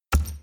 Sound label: reels stop; Great Book Of Magic Deluxe; Wazdan